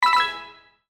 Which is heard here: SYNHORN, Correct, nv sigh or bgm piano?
Correct